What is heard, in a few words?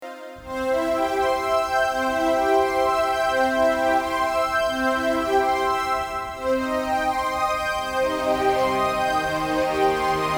Atmosphere